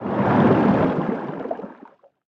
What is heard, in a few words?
creature arctic ray swim fast